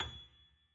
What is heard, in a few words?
key